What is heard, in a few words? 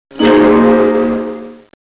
doong